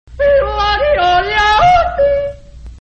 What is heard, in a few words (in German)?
jodeln; hl2dm; oppressive territory